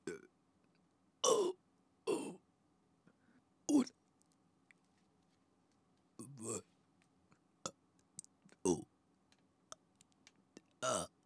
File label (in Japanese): ゲップのみ（淫語無し）; ゲップ淫語